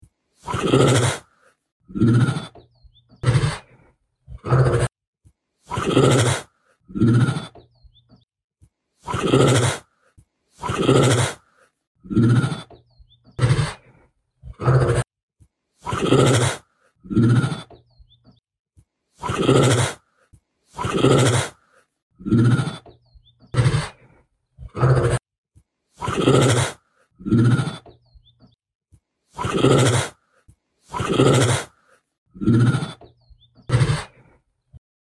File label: Chuffs